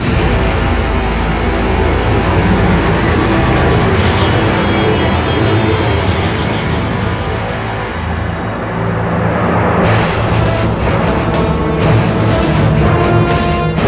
Ice Boss; preta vendetta rising ps4 windows gamerip 2017

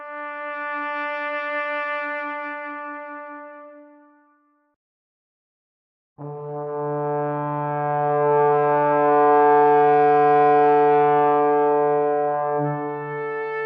Camp Brass D; Torchlight III; PS4

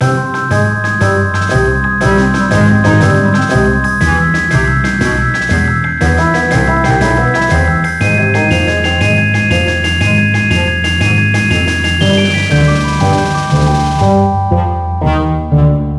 reveal music, Magical Forest; Birthday Party Bash; Wii

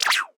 Button Clicked

